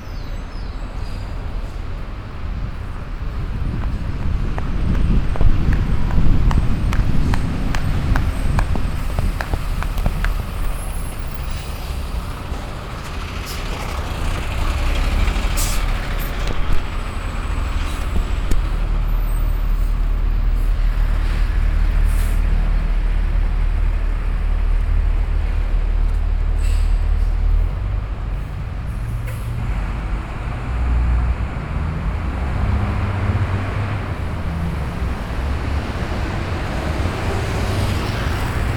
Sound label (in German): auf der strasse, Brasilien; Fieldrecordings